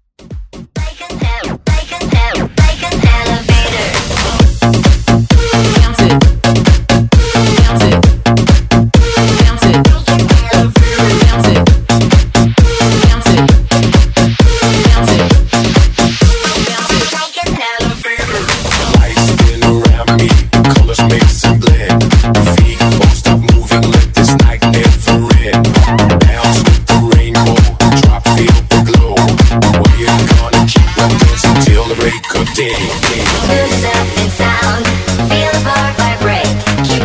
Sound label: Dance Redrum